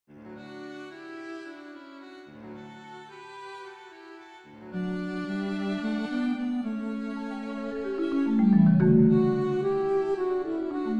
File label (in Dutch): Viool Basfluit en Marimba Stuk; Suite for Bass Flute, Marimba and solo strings; Dans